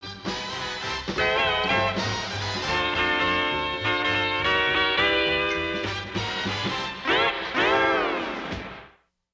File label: guitar intro, jingles; KOMA